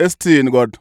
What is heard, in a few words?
Vocal Chirp, African Voices